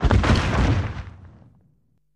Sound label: Dragon Falls, dinosaurs